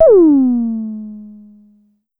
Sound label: Drum X Tom; Pearl Drum-X